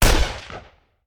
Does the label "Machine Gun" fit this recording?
Yes